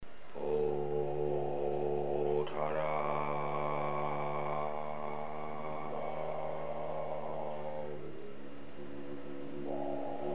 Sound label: chanting